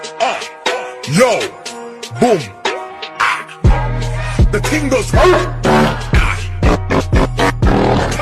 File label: the ting goes fart